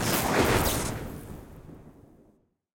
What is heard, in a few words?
lightning Shoot; netent; magicportals